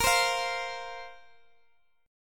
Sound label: A sharp Suspended 2nd down Guitar Open G